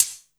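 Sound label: closed hi-hat, drum & bass; Ueberschall; Drum & Bass Resonance; 150 BPM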